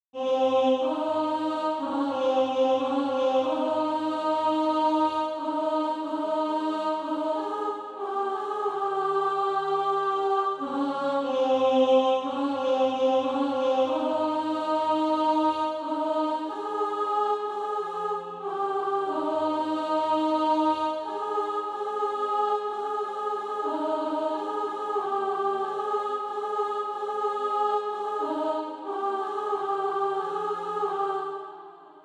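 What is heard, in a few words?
alto, Practise Music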